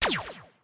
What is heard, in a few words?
fire laser; Adventure Time - Angry Betty; ThrillGames